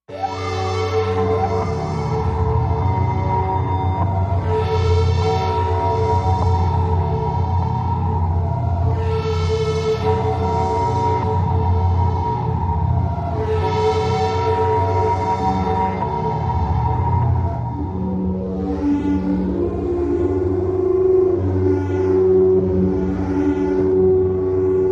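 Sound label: Cold Fusion Chamber Machine, alarms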